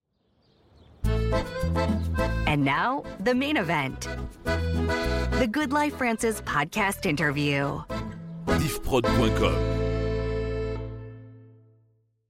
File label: podcast Jingle Interview; The Good Life France